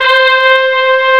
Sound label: brass C4; Flashback